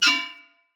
Melee Impact Metal, Weapons

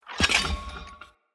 Collector Bomb Pickup

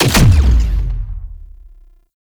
Incarnon Wrist Gun Alt Punch, Weapons; Warframe; Tenno

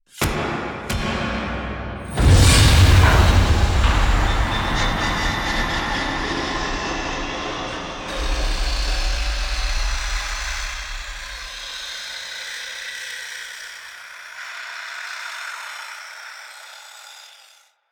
SFX Guards Queen Enter; Warframe; The War Within; Queens Lair; Cinematics

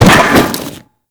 Fall Metal; Foley; Warframe; Characters; Tenno

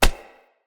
Unarmed Punch Impact Flesh; Weapon Impacts; Player; Combat

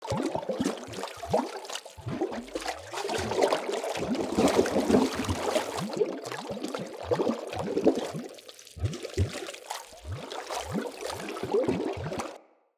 Water Hazard Gurgle Loop A; Undercity

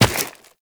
Run Rock, Footsteps; Warframe; Tenno

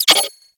Bomb Carry; Warframe; Missions; Raid; Grn Bomb Objective

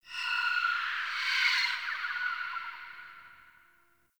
Distant Egg Cries; Warframe; Tau

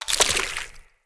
Attack Two Tell; Enemy; Corrupted Sproutfolk; Combat

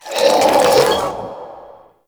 Embrace Shockwave Cast; Warframe; Broken Frame

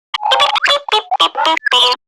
Garden Drone Vocal; Warframe; Zariman; Zariman Gardener Drone

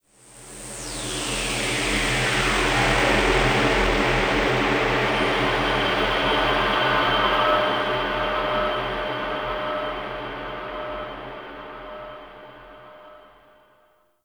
Exterior Ominous Void Weave; Warframe; RailJack; Ambience

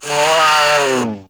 Kavat Vocal Death; Warframe; Warframes; Khora

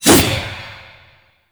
Void Tonfa Whoosh, melee weapons; Warframe; Zariman Tonfa; Void Mode

